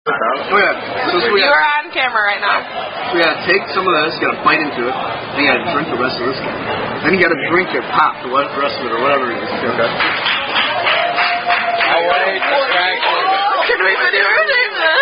Birthday, Happy Birthday; Red Robin Birthday; Happy Birthday (Tune Sound Off (The Duckworth Chant)

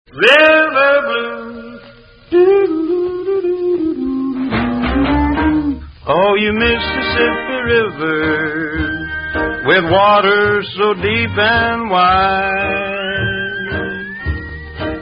american roadsongs

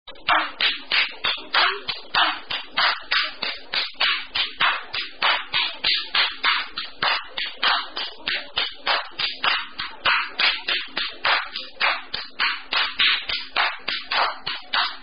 Clapping (No Words); Hp Hands Ms Mary Mac

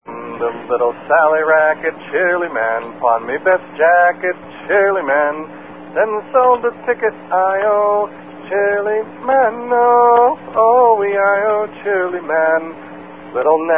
Chili-Man [Sea Chanty]

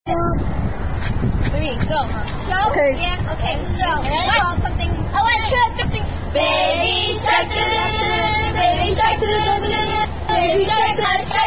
[Aka Attack Song]

